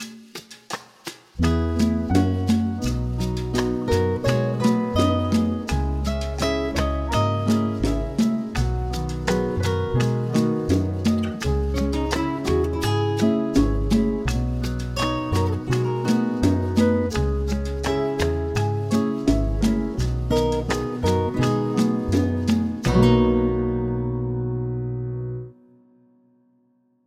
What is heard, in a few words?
major key chord singles